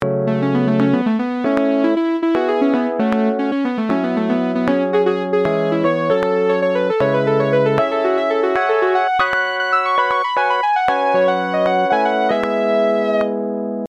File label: synth solo